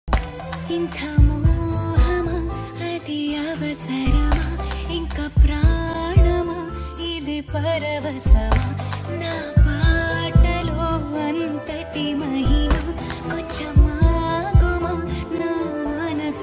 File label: Telugu ringtone